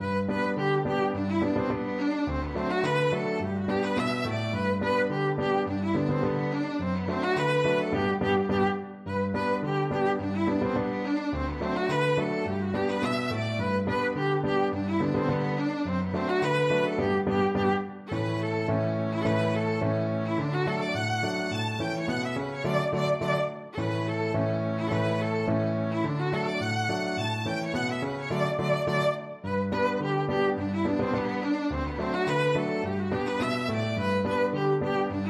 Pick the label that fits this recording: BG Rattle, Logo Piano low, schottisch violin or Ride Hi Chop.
schottisch violin